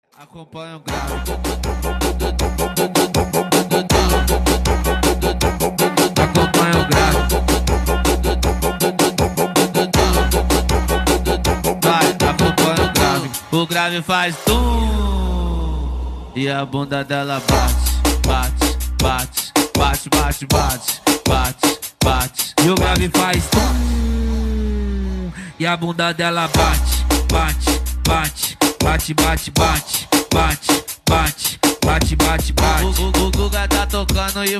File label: Follow The Bass Intenso Boom Boom Zumba